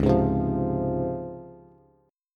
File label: Db Major 7th Db down Bass; strummed; JGuitar